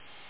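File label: hi hat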